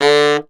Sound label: Tenor Sax Portato D2; DirectWave; Varazdin Orchestral